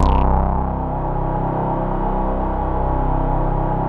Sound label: CS-15, BASSES